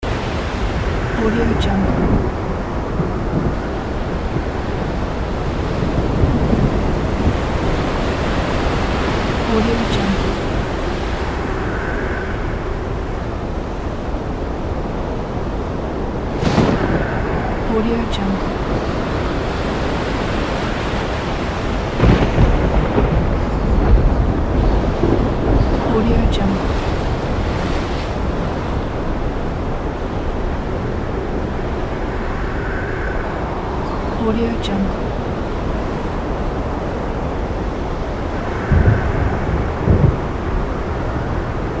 thunderstorm at sea

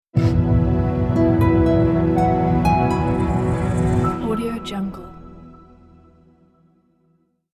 ethereal logo

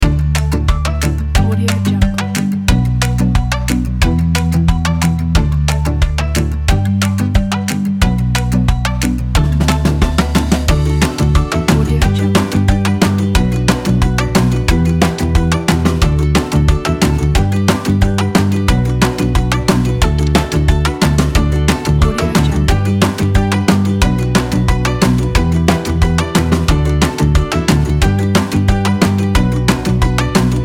cheerful happy mood; katunes

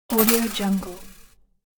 coins

